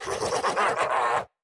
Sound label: Cry; Golzy